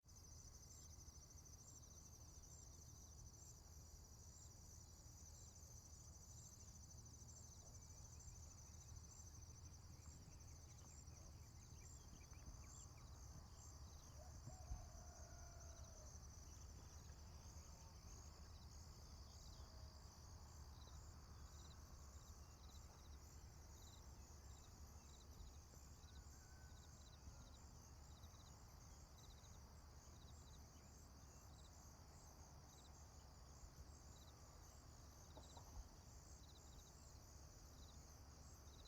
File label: morning farm